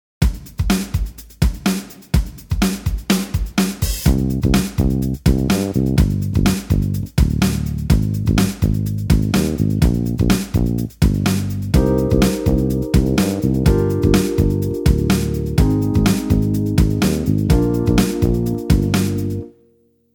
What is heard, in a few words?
bass 16beat